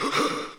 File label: "huh huh", voice